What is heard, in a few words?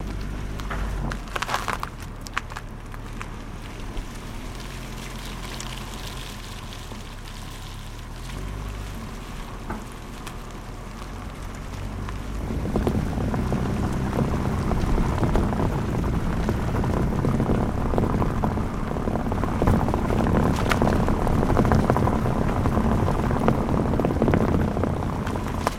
Car Tires On Wet Cobbl